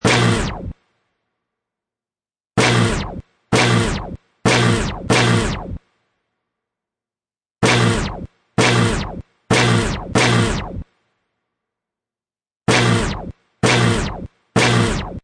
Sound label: DJ Scratch Hits; Ringtone; Music Ringtone; Musical